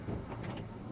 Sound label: Wiper Washer Off; SIMUZENSOUND